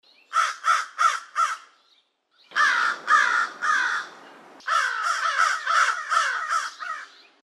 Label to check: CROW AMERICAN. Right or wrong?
Right